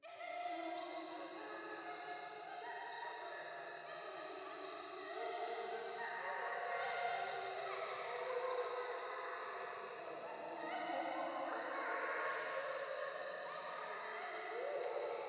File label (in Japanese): 喘ぎ 山奥夜